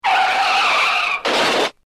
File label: scratch